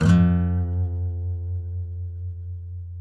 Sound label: COLLINGS F 2, Guitars; Spectrasonics; Hans Zimmer Guitars VOL-2; FNGSTYLE PFM